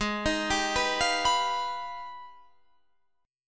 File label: Ab Minor Major 13th down Guitar Standard; arpeggiated; JGuitar